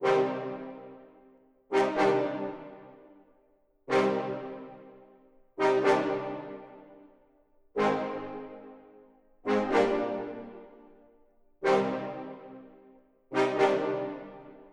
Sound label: 124 BPM C Min Brass; Cymatics; Rari; Destiny Gold Expansion